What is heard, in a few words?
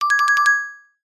comedy marimba flutter or shake short high pitch; sound effects one